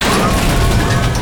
door

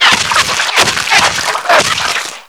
blood

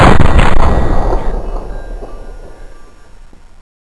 m60 fire

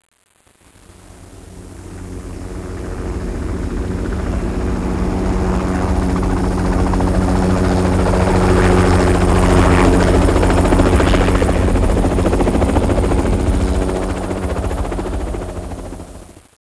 heli; cs desertbunker; pipedream

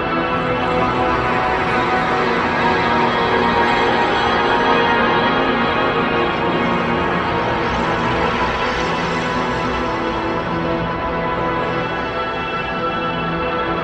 teleport loop; ambient; morass beta

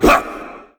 punch

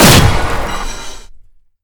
trooper shoot

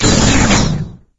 door close; dm subchamber

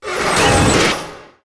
wheel, doors

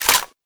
shotgun shell; weapons; kuilu

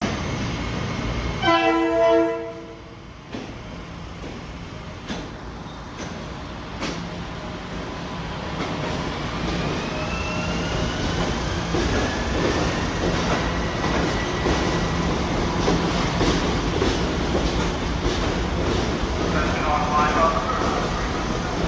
train; croton

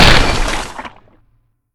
swamp wall

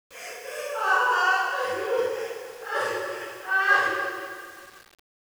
cry; de jor1

